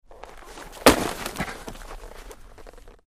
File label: Football Block Grunt, football